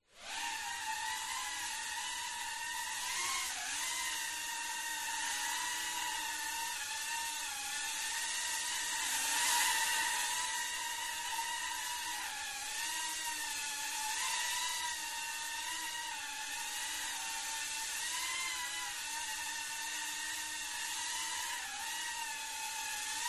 Drone; fox audio

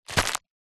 plastic thick packaging grab; zapsplat; foley

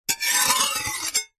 warfare knife blade scrape draw metal

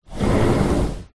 household door sliding cheap

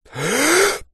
human male breathe in inhale holding breath; zapsplat

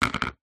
household plate plastic childs down on surface

